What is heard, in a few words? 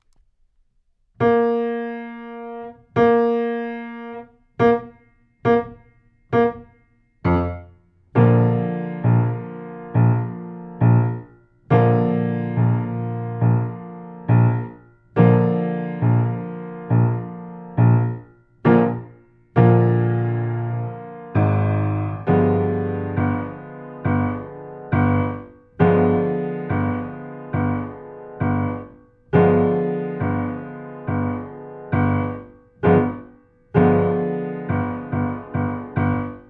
Bb 8 bars, sax